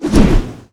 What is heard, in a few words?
Weapon Swing Hammer Slow Heavy; Cielonos